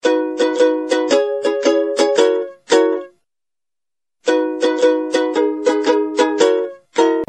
Sms Hawaii

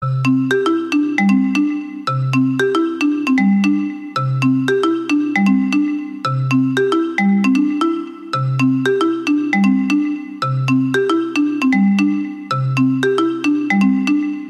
Galaxy S7 Best Tune, suonerie

